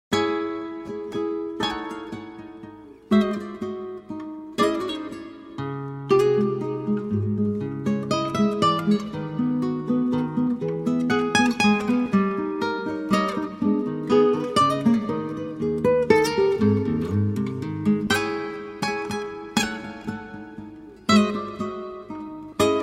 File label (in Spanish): MINUETO; Albeniz